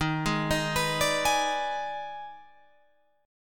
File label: Eb Major 7th Suspended 4th Sharp 5th Eb, Guitar, Open F; EbM7sus4#5; arpeggiated